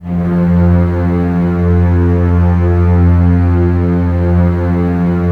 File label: STR TREMOLO, Strings; ILIO; Kirk Hunter Virtuoso Strings VOL-3